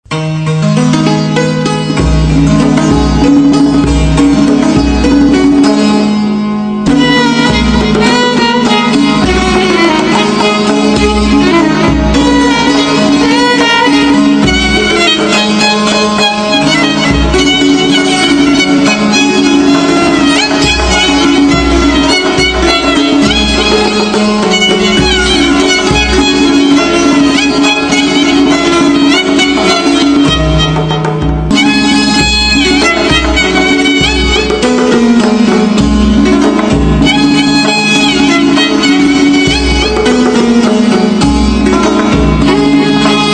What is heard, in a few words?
Violin